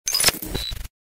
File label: Error glitch